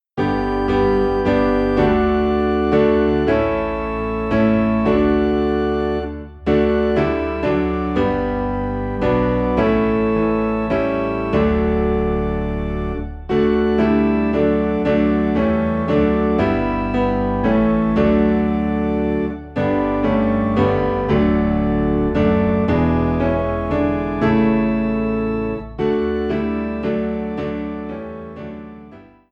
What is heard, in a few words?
piano and organ low in D; Faith of Our Fathers